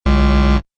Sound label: Game Fail Tone, Video Game Sound Effects